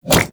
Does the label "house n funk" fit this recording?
No